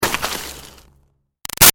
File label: Snow Impact Soft Messy Ice; Weather